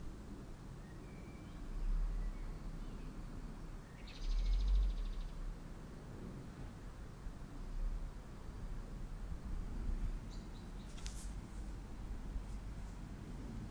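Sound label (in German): aufwachen und eine Amsel hören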